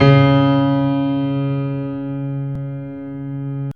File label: PED FF C, GRAND PED; Best Service Advanced Media Trax Modern Composer